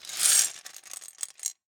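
Gumball machine, metal, glass, candy, flip, jostle, clatter, quick; Eiravaein Works; Vaeyan IV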